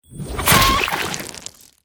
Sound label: FlyingChicken Death